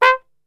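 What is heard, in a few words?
C3, flugelhorn, brass; Best Service; Brass Super Section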